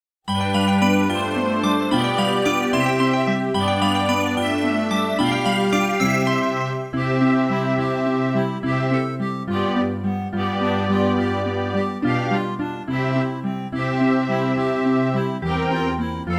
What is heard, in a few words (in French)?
musique